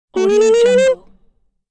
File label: onomatopoeic oldschool game ding